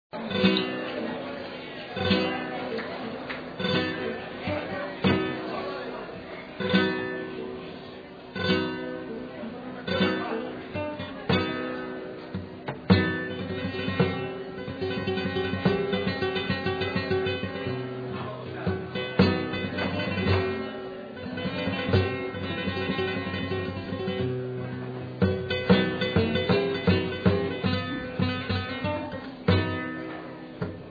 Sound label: Solea Por Buleria, Cantaor